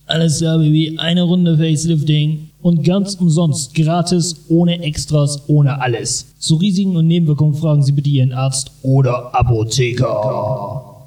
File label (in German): Jingels